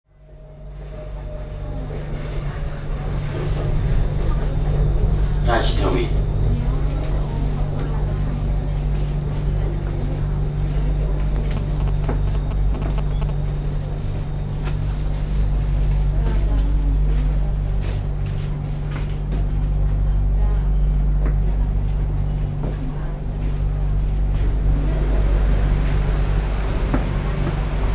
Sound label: bangkok subway